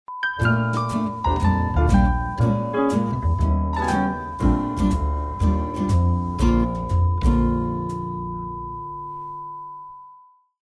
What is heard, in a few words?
groovy jingles